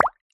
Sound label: click